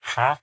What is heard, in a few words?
haggle, villager; minecraft; mob